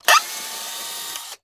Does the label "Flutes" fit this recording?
No